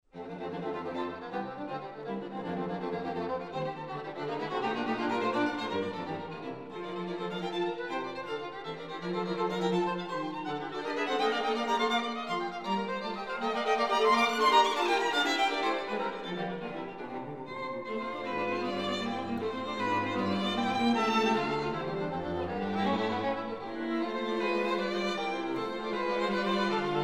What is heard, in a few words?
quartet; goldmark